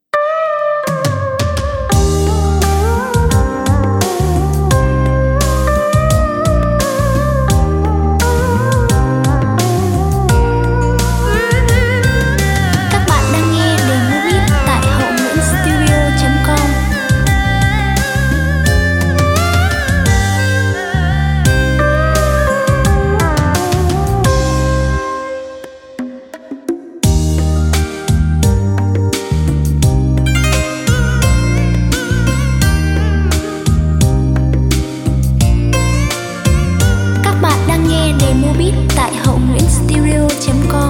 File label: beat, playback